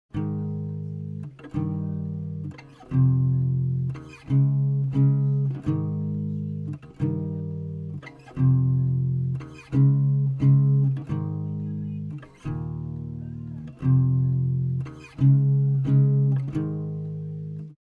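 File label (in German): dry, Piano & Flöte im leeren Raum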